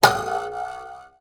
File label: cartoon twang wood stick between guitar strings raised pitch; zapsplat